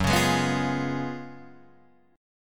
Gb Major 7th Suspended 2nd Suspended 4th, down, Guitar, Drop D